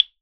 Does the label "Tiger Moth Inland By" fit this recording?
No